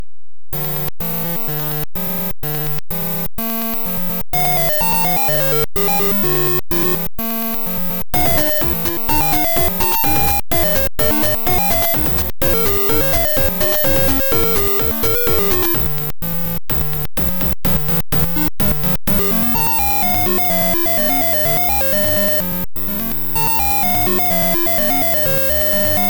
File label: z80; wabbitemu